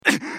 被ダメージ; ソホ(格闘型)

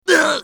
ダウン; コインブラトゥルーパー

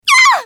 ダウン; エンジ

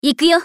ファイター女 移動

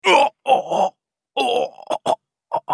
ダウン; ナジブ

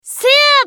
攻撃; カトリーヌ(技術型)

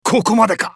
死亡; カート

